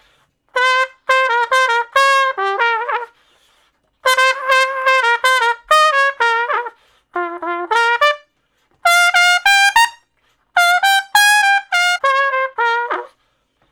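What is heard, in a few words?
Trumpet Slow Blues (Bb IV, IV, I, I); Crimson Blue and Fabulous Horncraft 4 RnB; Horncraft for R&B; Solos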